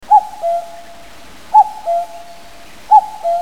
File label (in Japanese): kaxtukou